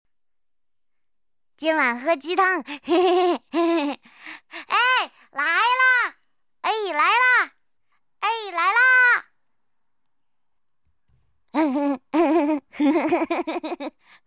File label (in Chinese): 女 游戏动漫 笑声